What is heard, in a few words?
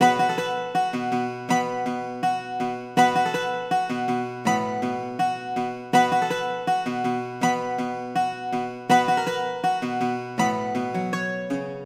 Bouzouki, 081 Bmin